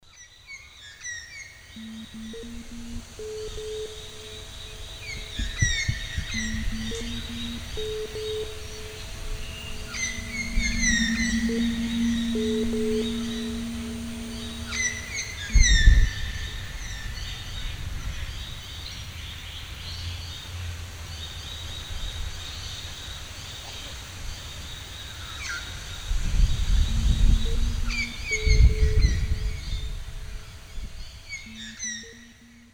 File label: australian electronic nature, Música Electroacústica; Al Pérez